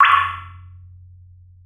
drop, waterdrops; tortuga

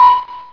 beep soft

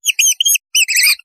mouse; rogue savage rats

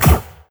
shot plasma

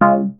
beep popup

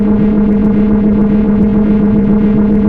shield loop

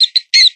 mouse; rogue savage rats

